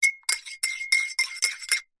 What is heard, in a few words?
stir coffee mug spoon; food drink; zapsplat